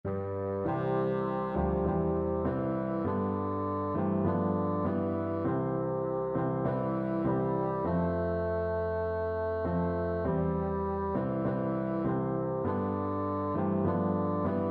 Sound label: bassoon